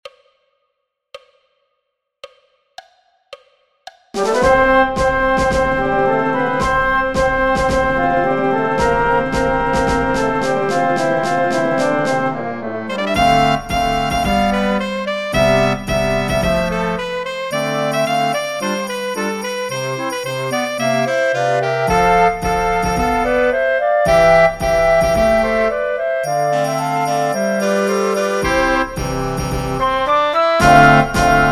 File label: Joyful Processional Orchester